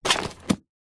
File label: household book telephone directory set down; zapsplat